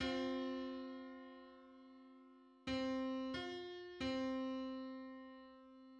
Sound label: Eighty seventh harmonic on C